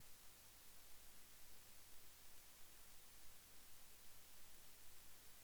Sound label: without mic, spluttery noise on audio interface inputs